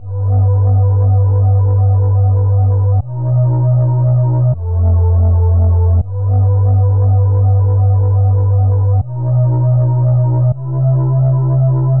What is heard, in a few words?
Synth Longbass; Sonic Foundry (Sony Creative Software); Knutrix Drum & Bass